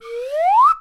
Lotus Flute Up

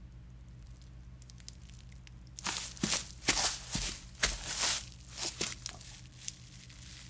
Rustling Foliage In Forest

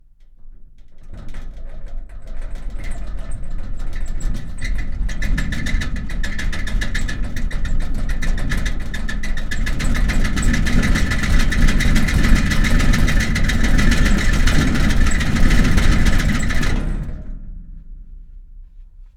Shaky Metal Cabinet

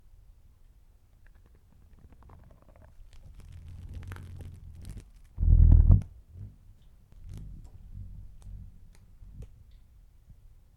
Moth taking off

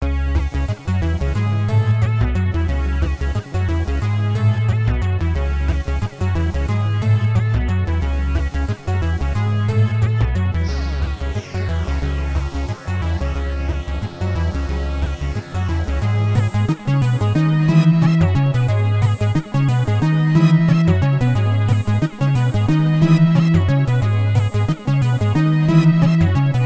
Berlin Techno Loop

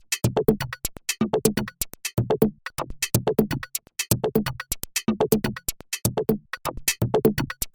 Minloop 121 124 Bpm

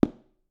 Step on wood

